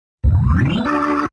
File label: organ